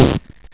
splat; Software Vault - The Gold Collection (American Databankers)

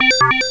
START DOWNLOAD; ALLPlayer 4.2.6.7; ALLConverter

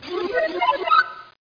GROW TWIG

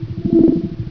BEAM; XWINGTIE

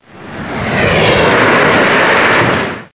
take off

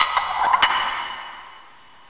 Rotate Tile; oracle

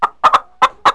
CHICKEN, animal; animal fun

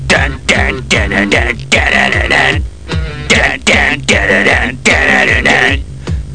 TADA; ProTris

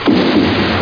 EXPLOS

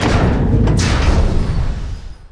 machine1; starsiege

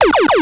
Laser Seq Riffs; Crackanoid

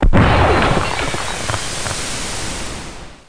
Impact; thexder

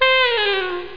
Drop; The Cruncher 1.1; Cruncher Candy

